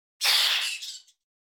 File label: Attack